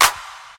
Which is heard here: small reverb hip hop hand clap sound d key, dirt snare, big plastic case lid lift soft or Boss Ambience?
small reverb hip hop hand clap sound d key